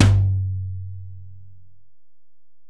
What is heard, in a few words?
Tom, Alesis DM-PRO, Drum Machines, Toms